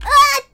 悲鳴

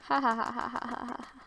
笑い

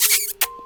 キス音; 音MAD; Cookie

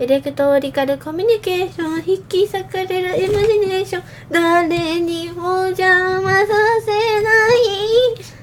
♪（アカペラ）; 音MAD; Cookie

